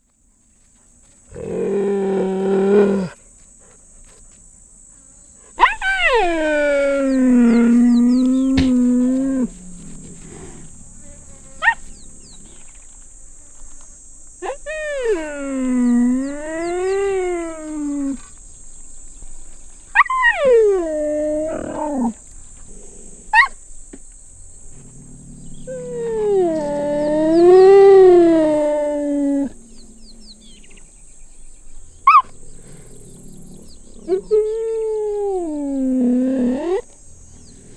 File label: growls chirps